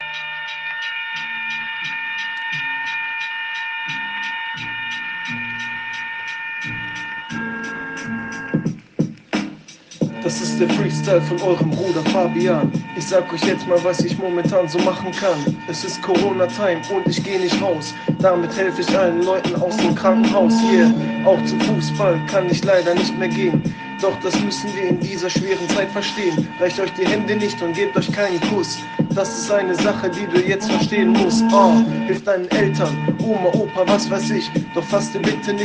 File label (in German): Corona Rap